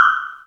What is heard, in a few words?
Korg M3R High Wood Block